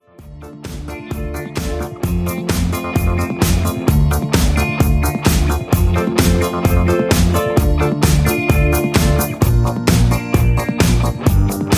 Instrumental with Leads No BGVs

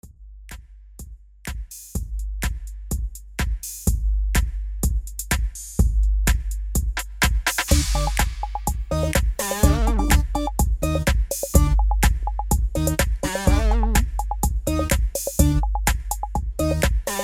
Instrumental with Melody

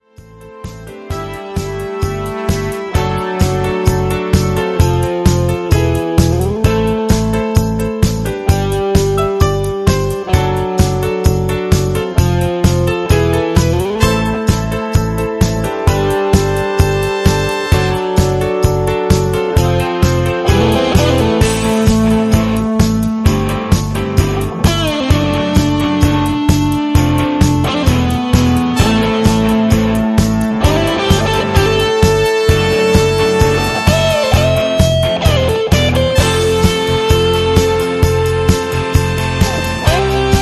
Brass and Beats Hoedown, patter

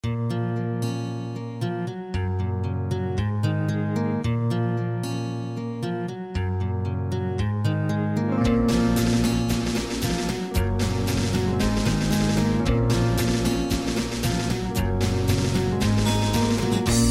High Key

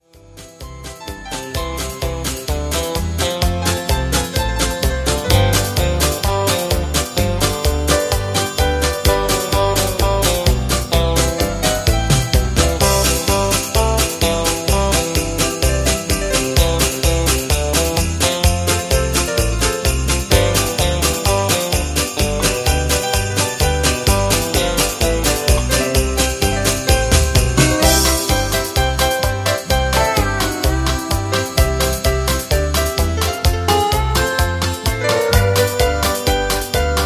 music with strong melody

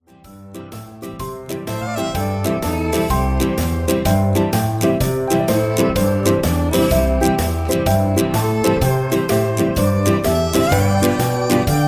Instrumental

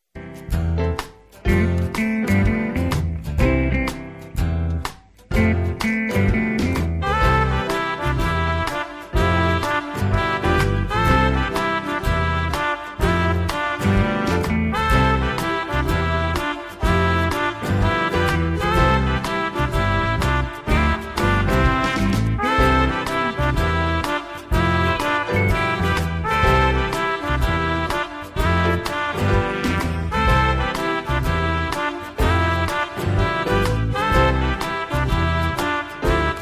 Two Step Instrumental

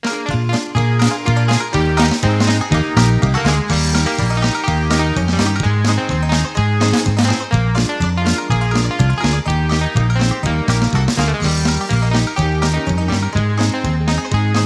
Instrumental

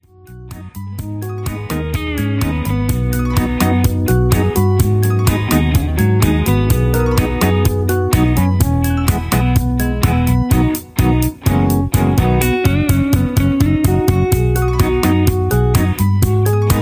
Instrumental with Leads

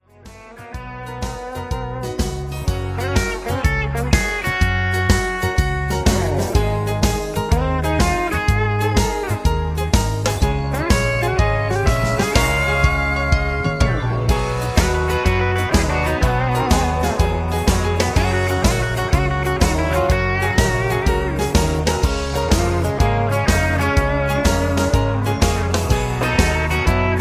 High Key

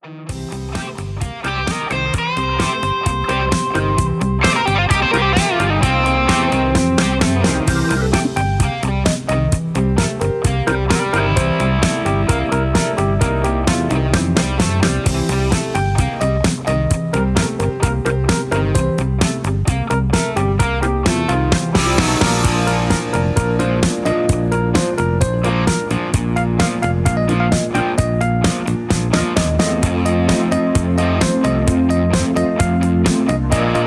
Instrumental Leads

